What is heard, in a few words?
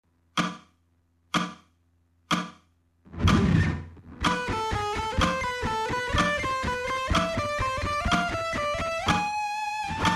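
alternate picking slow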